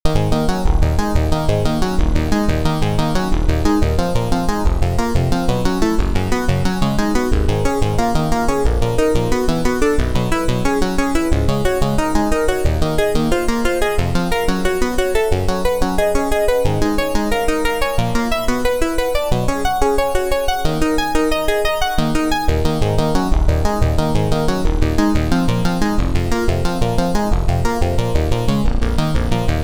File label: blush arp; the space soundset